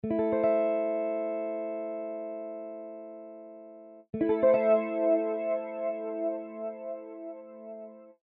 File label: Flanger